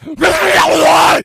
rage at victim, infected, male

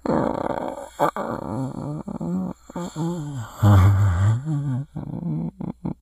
moan, infected, idle moaning; npc; source engine; left 4 dead 2

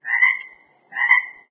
frog, frog groups; ambient; random animals; Left 4 Dead 2; Source Engine

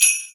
xmas sleigh bells

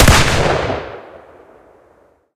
shoot; winger; video games; source engine; tf; weapons

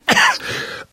cough, survivor voice; source engine; left 4 dead 2; namvet

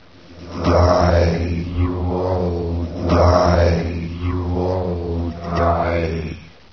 die; Nihilanth; Source engine

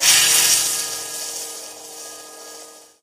lightbridge elec, footsteps

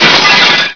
bust glass, debris; source engine; hl1; video games

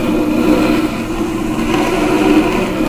wood box scrape smooth loop

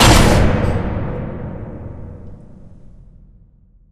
crusher impact; Source Engine; Portal 2

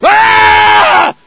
scream, scientist; hl1; Source engine

